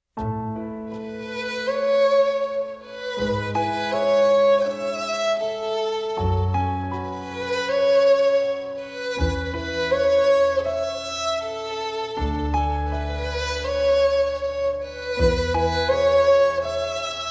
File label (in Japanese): violin ver.